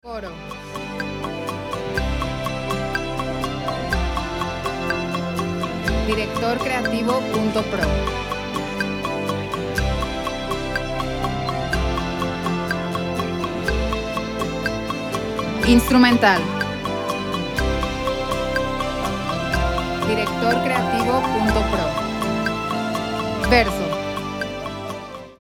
Quebrantado Fue, C, 123, 4/4

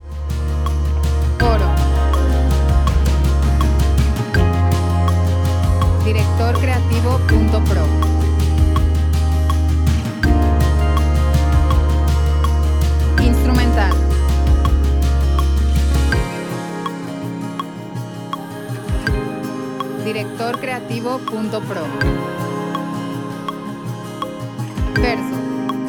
Somos, 81.50bpm, 4/4, Ab

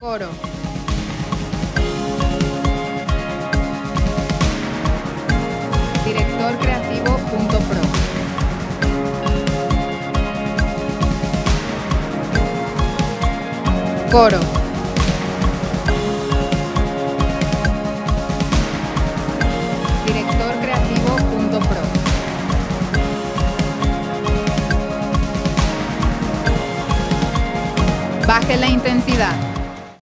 68BPM, 4/4, Db

